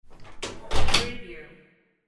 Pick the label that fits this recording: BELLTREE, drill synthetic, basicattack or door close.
door close